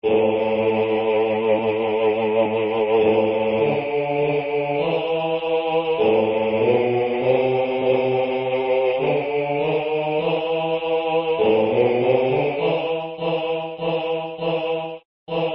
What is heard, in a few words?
vo pole basse